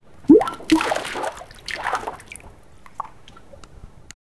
um mergulhador a entrar n, pool

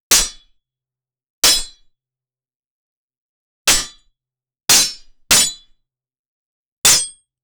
som de corte de espada, melee

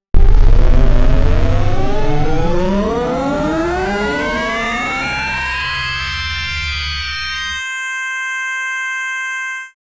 um tiro de uma nave, pixel game

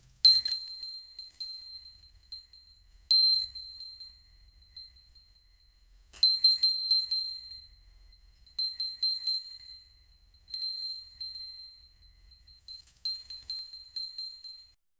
crie um sound effect de, fairy

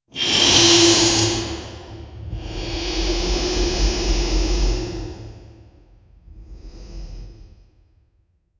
explosão de energia, energy attacks

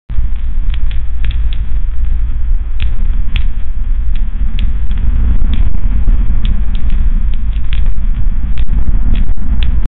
barulho de lava, environment

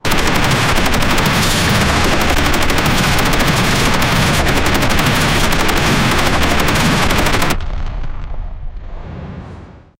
artilharia naval batalha

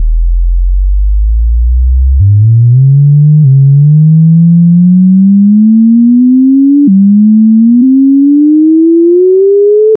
sons suave e agudo curto; video

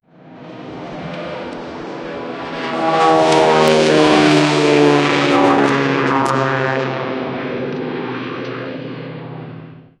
um aviao colidindo sobre, aviation

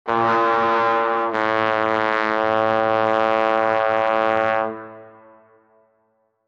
cria um som de trombeta; apocalypse

